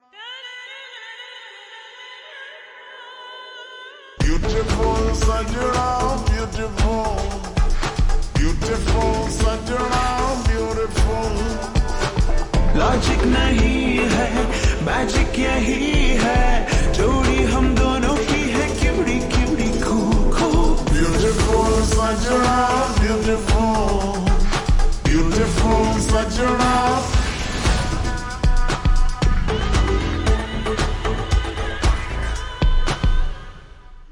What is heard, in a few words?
Slowed Reverb Ringtone